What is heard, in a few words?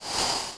INTERFACE Menu Slide; Earth and Beyond; EA Games